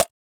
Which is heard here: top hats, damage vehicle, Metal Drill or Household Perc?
Household Perc